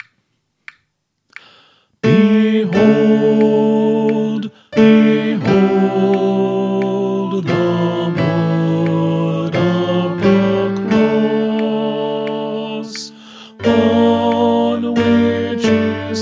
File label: Alto; St. Columba Choir Practice Tracks